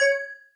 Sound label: marimba; ゆめ2っき